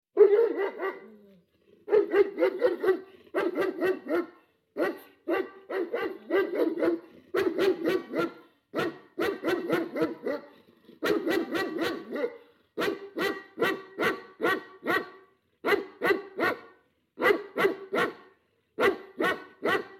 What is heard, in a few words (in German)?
hund